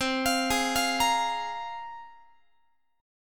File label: C 7th Suspended 4th Sharp 5th, Guitar; C7sus4#5 arpeggiated